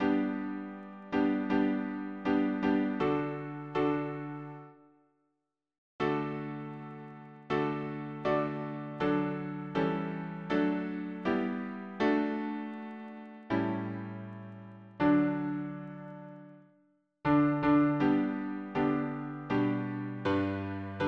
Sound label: harmony piano